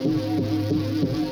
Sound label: Obstacle Course Floating Pillar; Warframe; Ambience; Clan Dojo